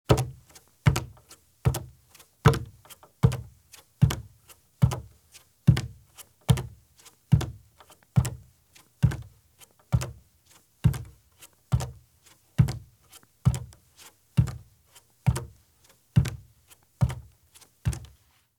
Bare Feet Walk at Slow Pace on Wood Porch or Footbridge; Sound Ideas; Footstep Sound Effects